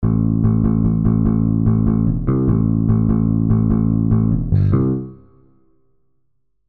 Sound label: Scoring Bass Playable Basses Default